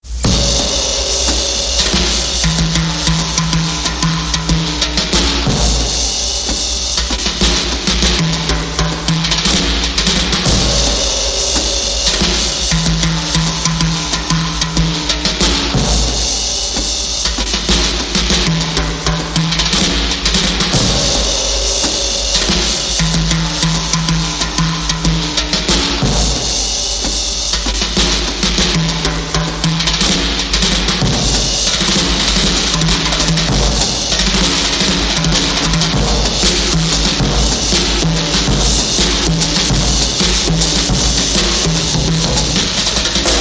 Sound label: drums